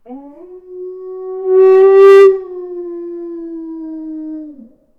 realistisches wolfsgeheul

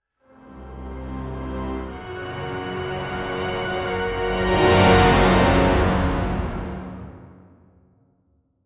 epischer anime track der, awakening

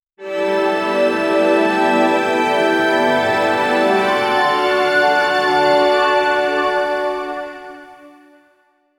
melancholische sci fi, main menu

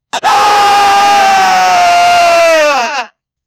freudenschrei mann viel a, adrenaline

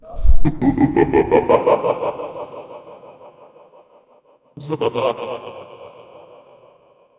ein teuflisches lachen im, horror ambience

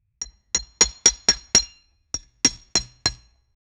glasblock; minecraft

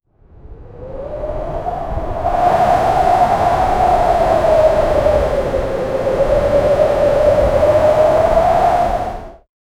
ein realistisches kraftvo, mediterranean

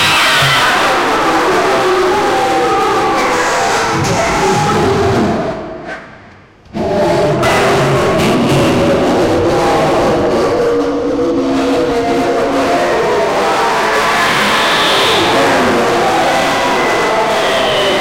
chaos zerstrung und demon, demonic

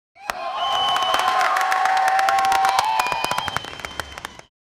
eishockey puck trifft lau, hockey

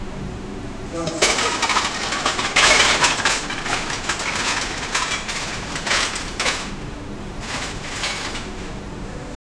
das sortieren von paketen, conveyor

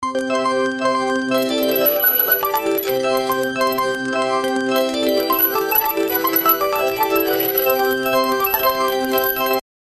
beim slot spiel kommt ein, slot machine

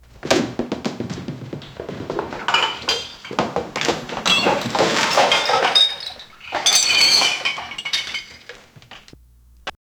realistische geruschkulis, room tone

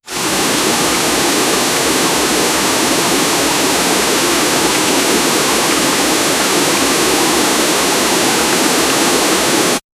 Wasser, das einen hohen Wa, waterfall

